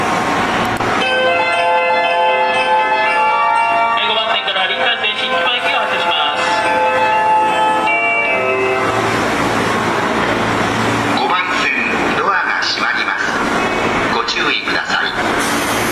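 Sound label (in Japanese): 発車メロディ, 大崎駅, 湘南新宿ライン・りんかい線; 新木場・横浜方面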